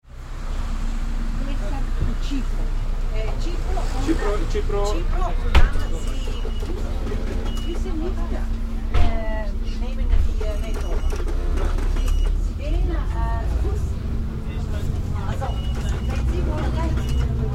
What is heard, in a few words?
bus; bus246 to cornelia